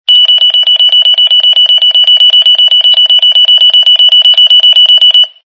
alarm beep ringtone

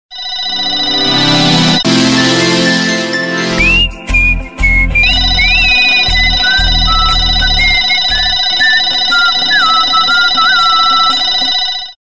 ultra tone remix

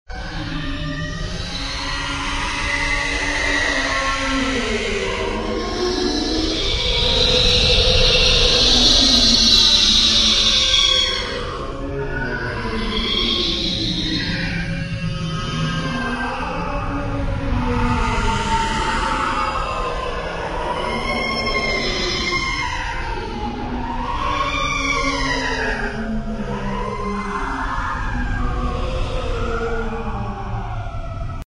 horror ringtone

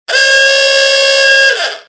alarm campain ringtone

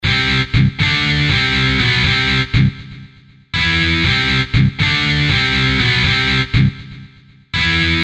a new tatoo riff

